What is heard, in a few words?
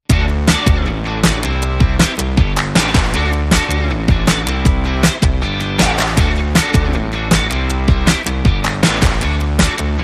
No Organ